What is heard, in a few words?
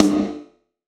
Snare, Gated Reverb; creative gate; musicradar